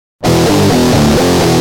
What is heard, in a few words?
G Jam, Guitar; Industrial